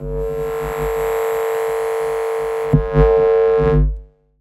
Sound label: hum buzz hum; sonniss gdc